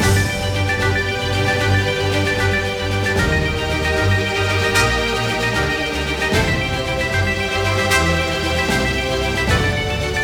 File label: Big Win Loop; Santa; netent